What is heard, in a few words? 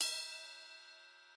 big ride cymbal sample c key